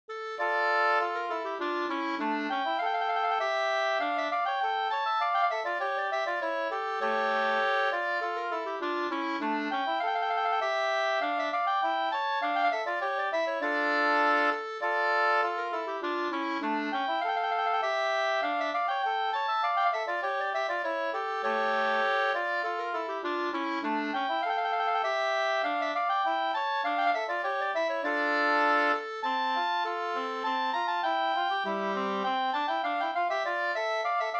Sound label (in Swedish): polska Senpolska; efter "Stor-Ante", Anders Olsson, Grundsjön, Borgsjö, Medelpad; med andrastämma av Herbert Jernberg